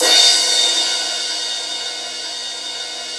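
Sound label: 16-inch crash A; InVision Interactive; Lightware VOL-1; Instruments & Percussions; CYMBALS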